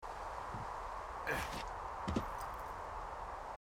player climbing over cliff; firewatch

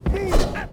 click fast; rome total war

